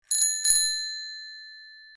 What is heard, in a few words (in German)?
fahrradklingel einmal